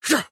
Attack Small; Black Adam

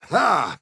Attack Medium

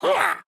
Land

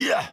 Attack Medium

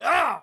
A Hurt

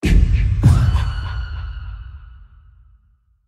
Kikikimamama Scream

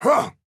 Attack Small

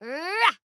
Attack Medium; Arya

